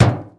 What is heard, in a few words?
metal; Mafie 1